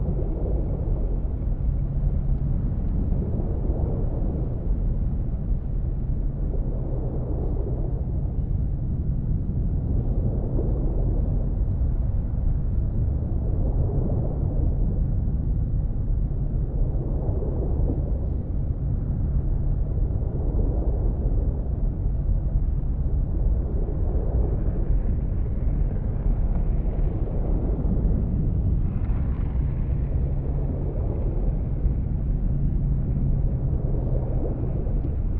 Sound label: cruiser idle; aquatics